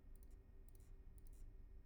mouse; IEEE AASP

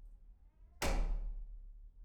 door slam; IEEE AASP

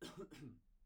clear throat; IEEE AASP

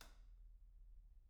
switch; IEEE AASP

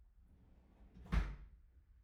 drawer; IEEE AASP

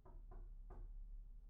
knock; IEEE AASP

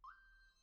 alert; IEEE AASP

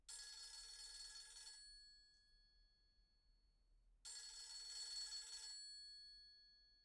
phone; IEEE AASP

